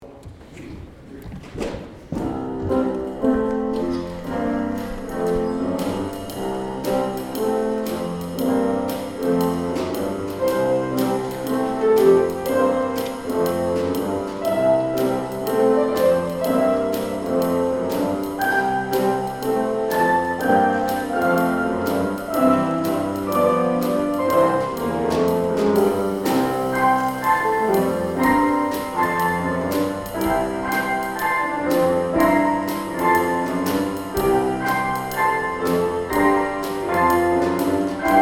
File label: Ensemble